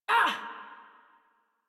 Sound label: Pain, Adult; Warframe; New War; Adult Operator